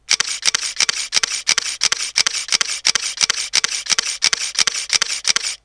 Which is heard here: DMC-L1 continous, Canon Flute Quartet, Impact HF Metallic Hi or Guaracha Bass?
DMC-L1 continous